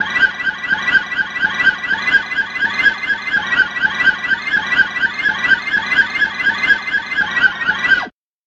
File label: fret noise bass